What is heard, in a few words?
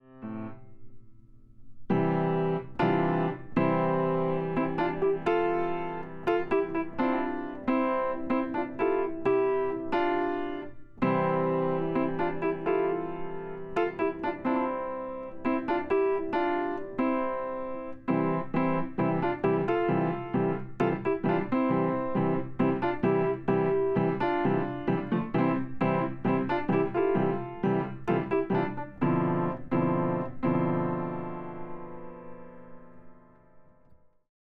Piano